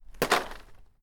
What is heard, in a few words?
Dropping Wood